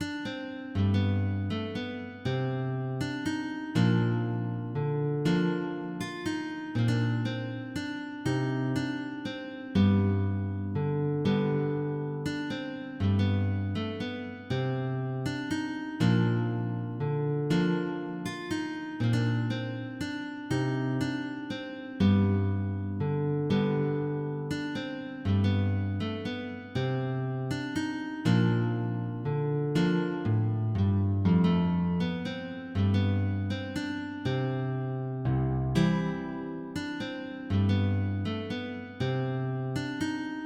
Gigue, MIDI; Lespagnioletta

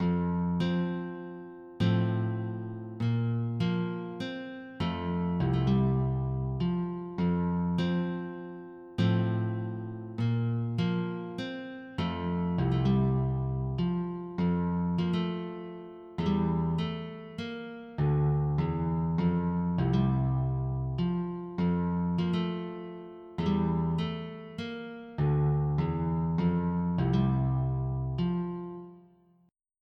Sarabande, MIDI; Petit Fanfan

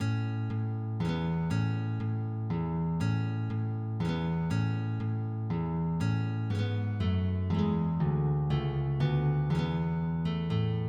Menuet du Tambour de Basque, MIDI

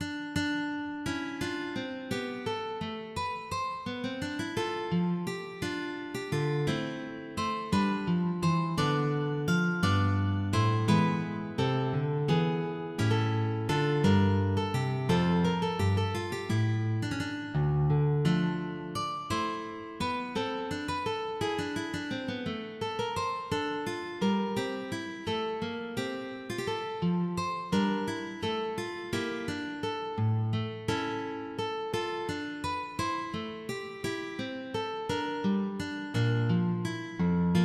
Courante, MIDI; Bocquet

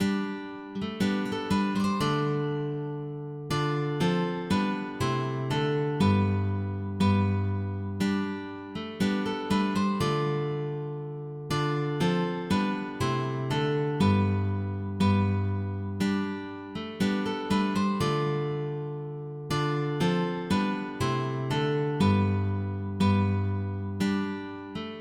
Allemande, MIDI; Claude Gervaise

